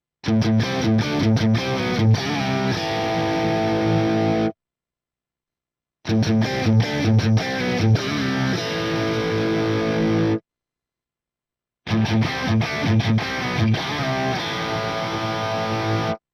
Crunch Split Humbucker

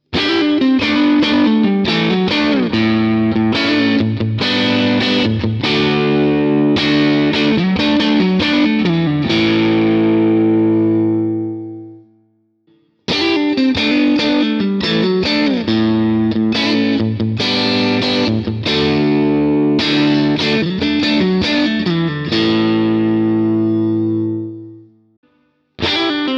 P90HB1 Clean; panico pickup p90hp1

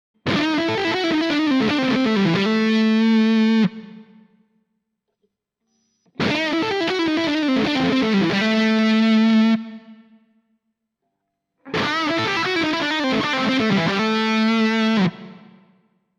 Lead Humbucker; panico pickup hb1 humbucker

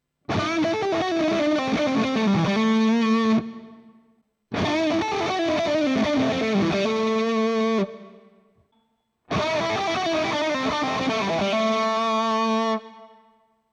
P90HB1 Lead, pickup; panico pickup p90hp1

